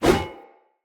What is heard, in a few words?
One Handed Axe Elite Whoosh; Weapons; Enemy; Axes; Sinecure One Handed Axe Elite